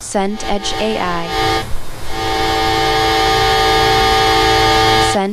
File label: train interior